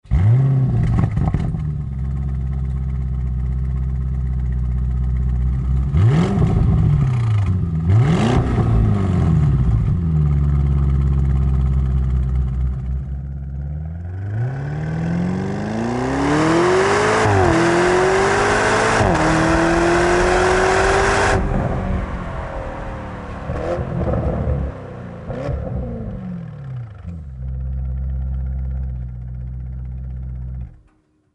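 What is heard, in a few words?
AUDI RS Q8, exhaust; EVOLUTION LINE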